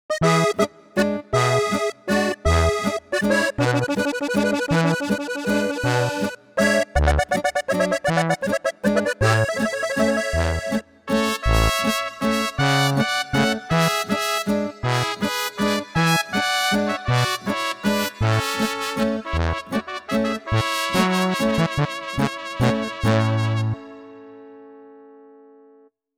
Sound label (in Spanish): Tuba Vals; Norteño